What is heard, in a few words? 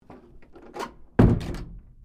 DOOR WOOD INTERNAL CLOSE, Sounds onboard HMAS Castlemaine; Boats; Vehicles